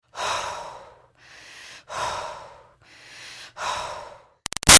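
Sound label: Human Female Breaths Exercise Cool Down; Breathing; Big Room Sound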